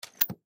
Slingshot Shoot, Slingshot Shoot Object Impact Thud; Slingshot Shoot Object Whistle Impact Thud; Impacts; Foley; Blastwave